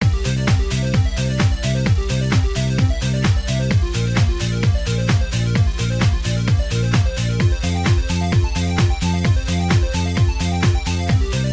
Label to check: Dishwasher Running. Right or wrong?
Wrong